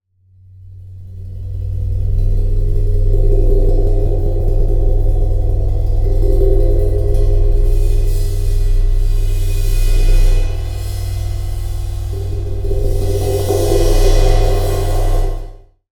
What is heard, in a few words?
Cymbal; Percussion Samples